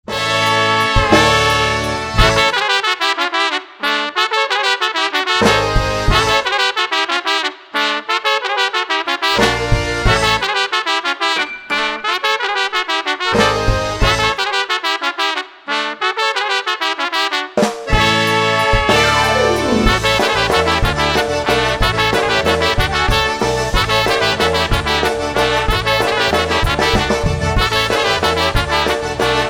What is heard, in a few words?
Oberek